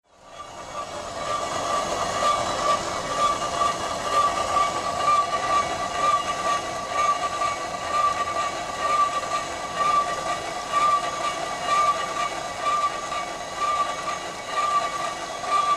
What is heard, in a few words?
Machine Room Industr